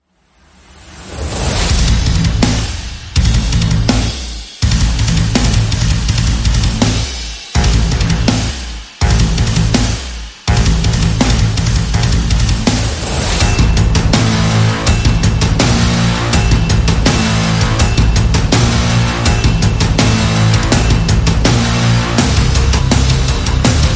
No Guitar

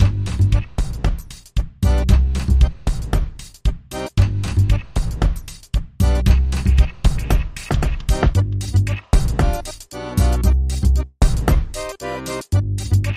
Tango Power Drums

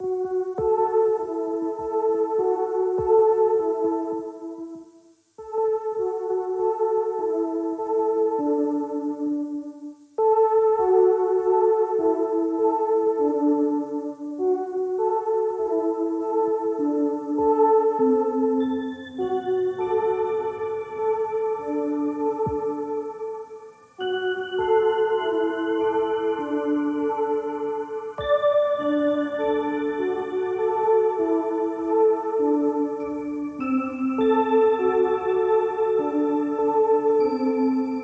Turbinated Bell

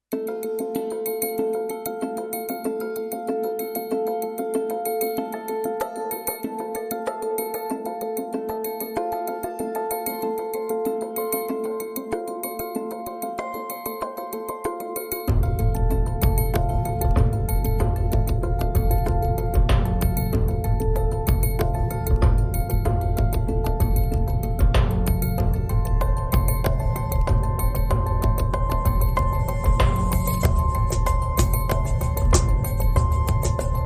Percussion

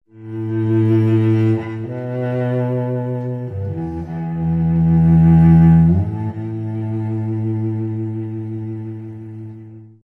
Melancholy Cello

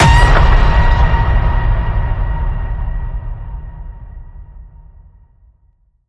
Ping It Loud

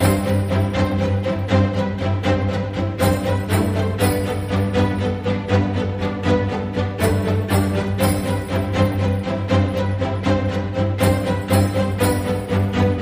Holiday Happy Miracle Atmos

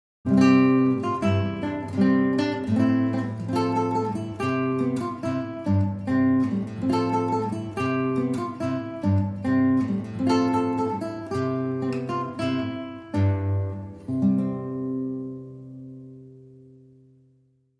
Solo Guitar

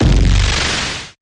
Hit Big Distorted Smash

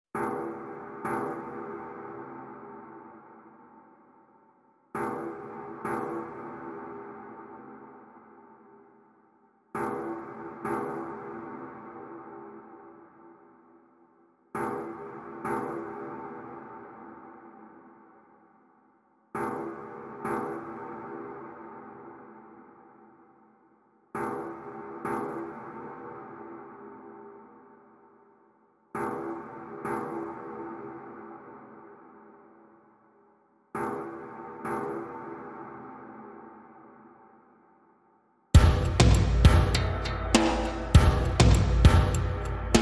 Drums Only

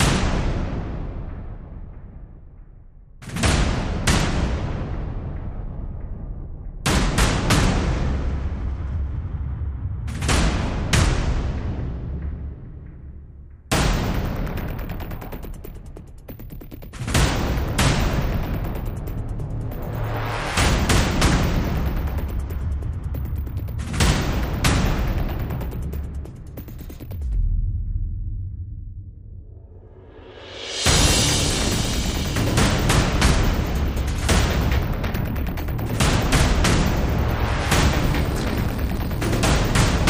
Immortality, No Lead Horns

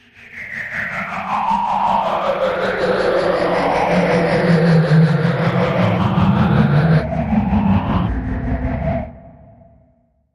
Heavy Pulse

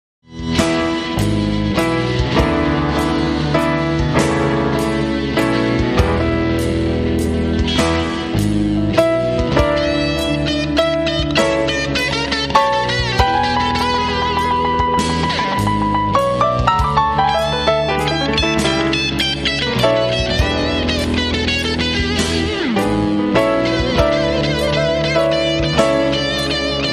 Royal Blues

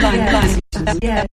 Sampled Voice Percussion Variation

